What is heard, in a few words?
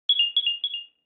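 Low battery Alarm; LG GM730 Eigen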